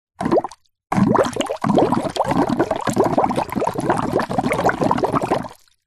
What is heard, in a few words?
foley water bubble plastic container blow air through plastic pipe